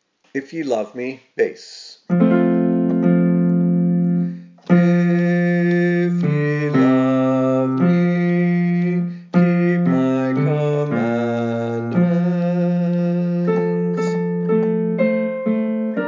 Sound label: Bass; Tallis; If ye love me